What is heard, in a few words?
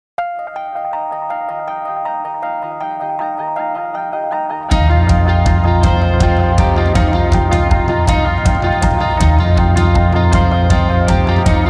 bells and whistles